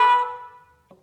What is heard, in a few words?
Key Basoon; Luxembourg Zwee